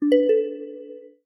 Call, notifications; Infinix; HOT-50-4G

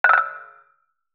haptic unlock fail; Sharp AQUOS sense lite SH-M05

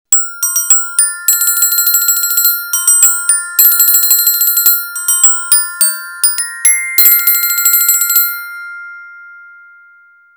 Drill, ringtones; Coolpad

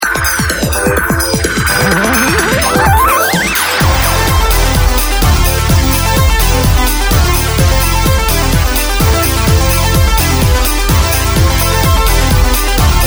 Disco, ringtones; Vertex Impress M

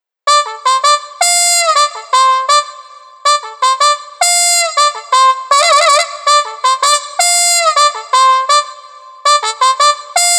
Jazz, ringtones; Gigaset; GS290

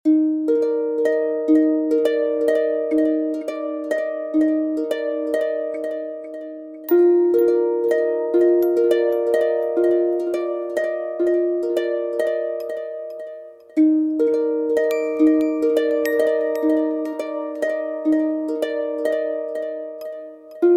Harp, alarms; Infinix Hot 12 Play

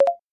Video Begin, ui; LeEco Le-2

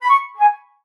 Mushroom, notifications; Honor Pad X7

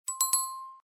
charge full, ui; Infinix Hot 12 Play

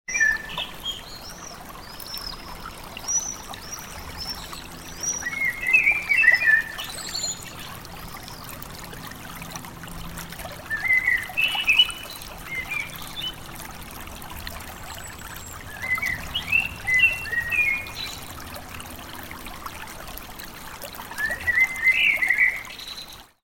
Mountain Village, alarms

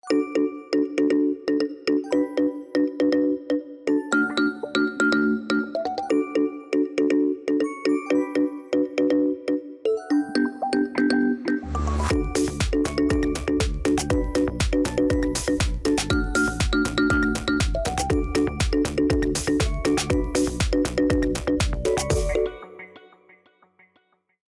Kalimba; Infinix; HOT-50-4G

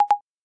Video Stop; LeEco Le-2

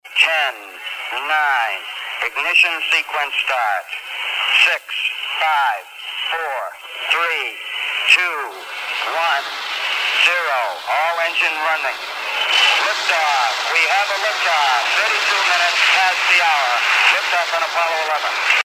Countdown, ringtones; Motorola AURA R1 Celestial Edition

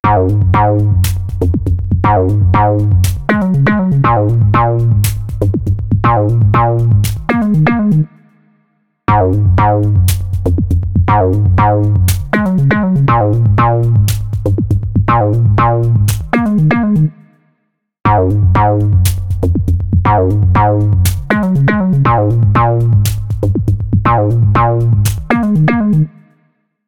Chic Bass Beat, ringtones; LG Velvet

